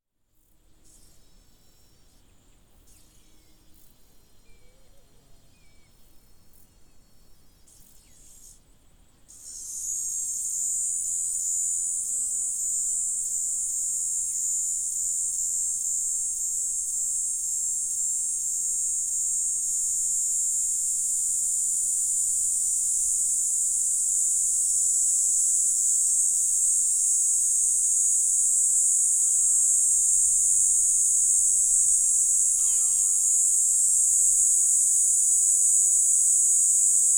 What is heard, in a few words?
Birds wood pigeon; Birds, Insects & Animals